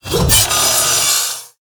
Chain Saw Medium Underlay Attack

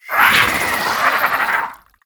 Scorpid Death

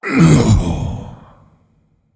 Male Elemental Death

